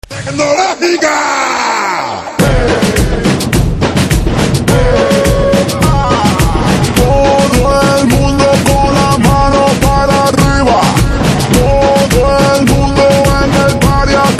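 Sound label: background music Telugu Ringtone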